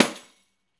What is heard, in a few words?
Pandiero, Tambourines